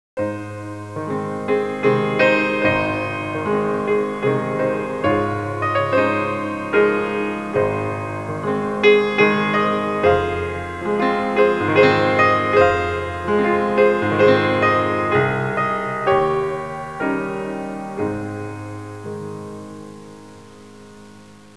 suonerie classica; musica classical

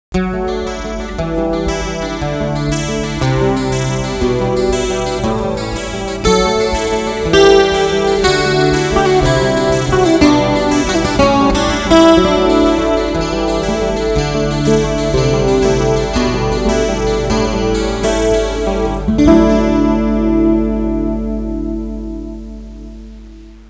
musica strumentale

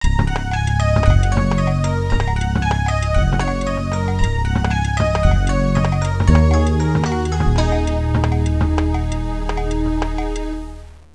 suonerie contemporanea; musica contemporary

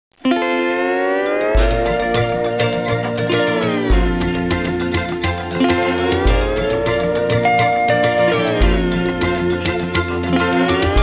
suonerie musica hawaiian

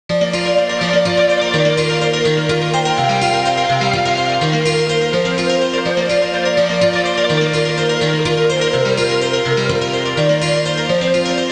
musica elettronica; electronic

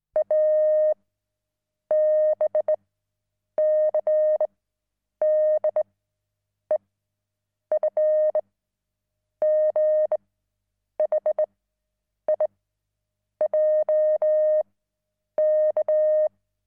CODICE MORSE TELEGRAFO; phone

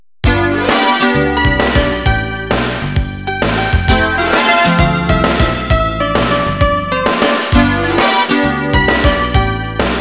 suonerie businessy

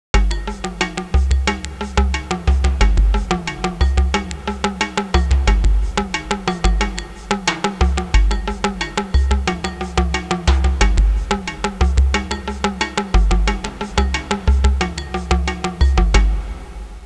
suonerie musica hawaiian

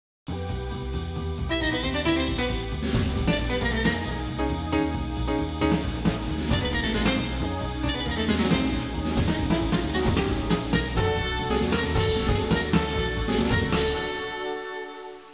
suonerie musica jazz

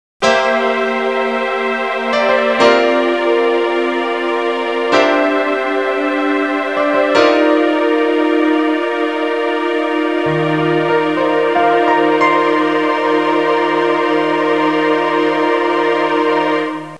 audio suonerie musica mistica

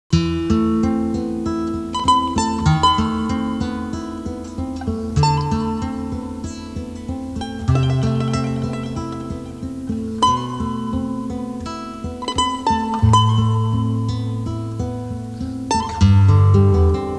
musica strumentale

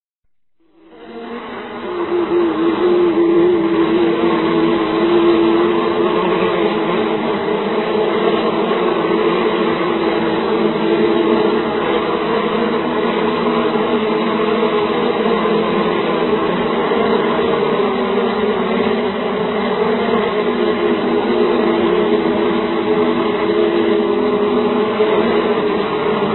insetti insect; animali

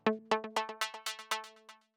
suoneria botton; beep

